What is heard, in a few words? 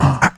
VOX2 L, Roots Disco; USB Soundscan vol.32; AKAI